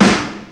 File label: warm sounding snare drum sound g key